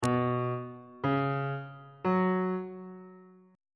b suspended 2nd triad chord intervals on bass clef